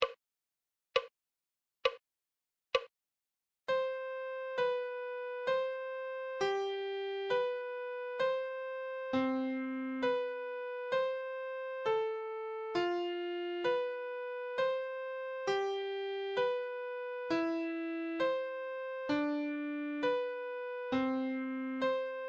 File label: musical reading in treble clef Adagio